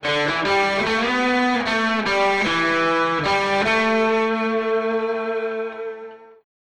OUTELECTRIC, Delay Using Fast Convolution (IR from Roland Space Echo)